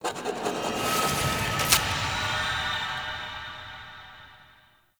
Echoes Paper Scribble; Warframe; Ambience; Entrati

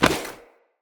Short Sword Catch; Weapons; Player

